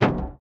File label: metal large inventory move; factorio 2.0.55